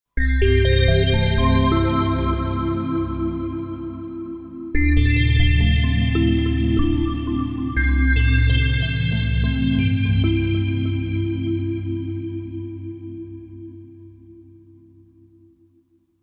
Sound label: Space Bell; Cypher2 BL Fantasy